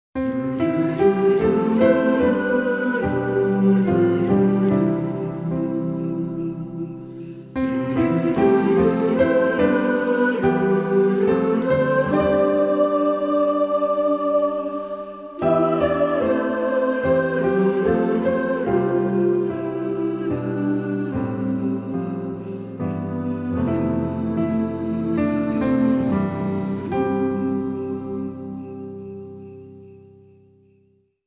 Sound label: Holiday Choir